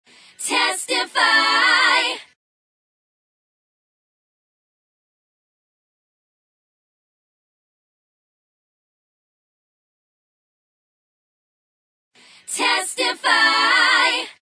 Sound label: backing track